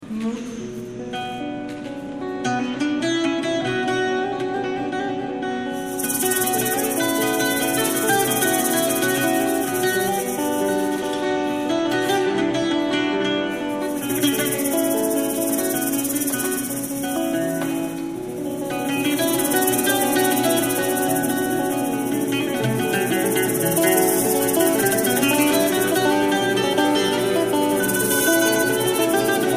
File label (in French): extrait bouzouk; live; au bouzouki; intro des Filles de Lorient au bouzouki